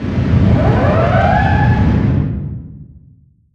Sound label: distance ship destroyer damaged attacking; Hearts of Iron IV Death or Dishonor